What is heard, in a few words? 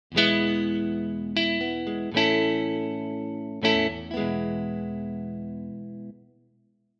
stratocaster e guitar; sibelius rock and pop